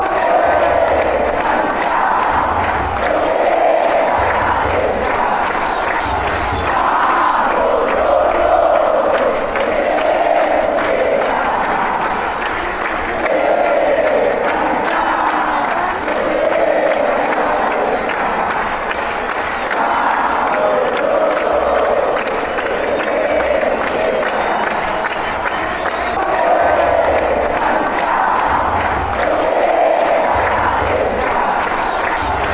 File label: deportivo cali chant